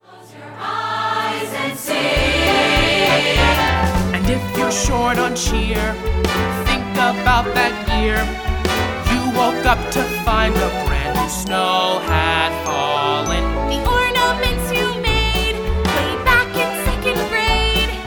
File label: ENSEMBLE VOCAL; Elf the Musical, Jr.; Audition